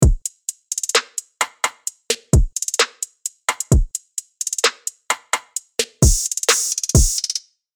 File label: Kit, 130, Drum; MIDI; Unison Essential MIDI Drum Kits